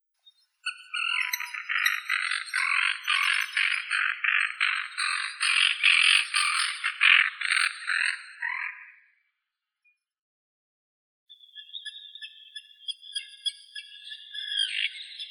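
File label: common tern, Sterna hirundo; krachka rechnaya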